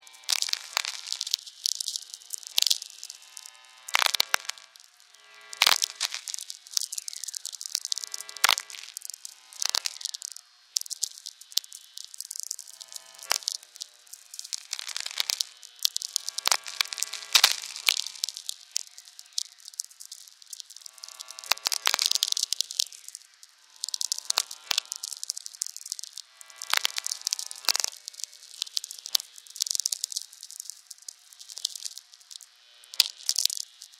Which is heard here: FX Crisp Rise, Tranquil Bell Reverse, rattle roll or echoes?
echoes